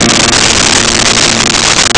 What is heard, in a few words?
Piano Bb2; Nintendo; July 2020 Gigaleak